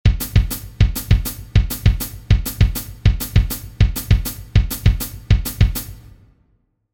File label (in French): pied 100 bpm